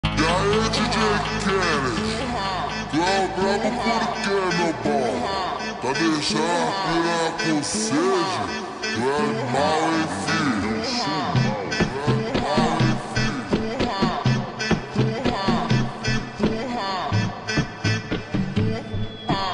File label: extra slowed reverb